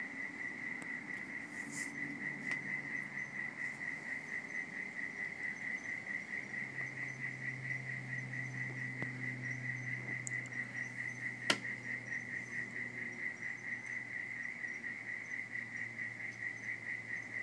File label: night time noises